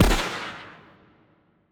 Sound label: shotgun firing